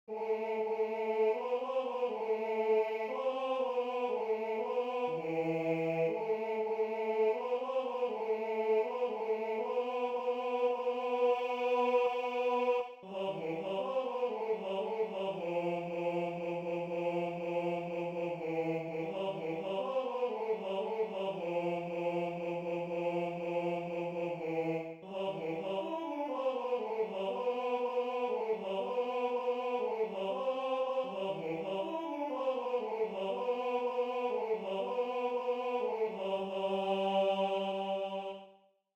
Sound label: Tenor II; Varda che passa Bella Bimba